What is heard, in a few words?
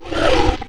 chicken big